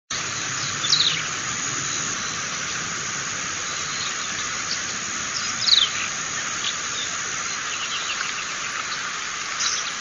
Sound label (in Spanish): voz golondrina parda